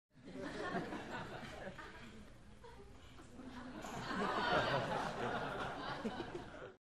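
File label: Crowd 100 Light Medium Interior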